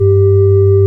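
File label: ORG Vox G, Continental organ; Keyboards of The 60's and 70's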